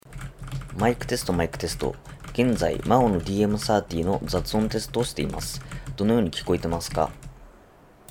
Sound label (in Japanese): maono dm30 雑音テスト